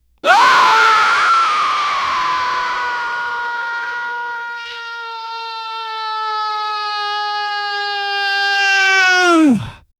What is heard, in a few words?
long intense male scream; sound effect